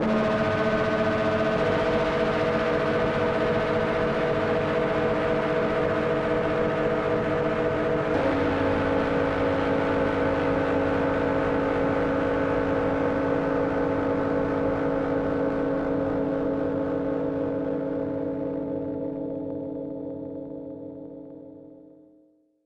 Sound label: tibetan bowl hits nightmare, cymbals from hell; Sonniss GDC